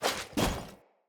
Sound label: Foley Heal; Enemy; Sinecure; Banneret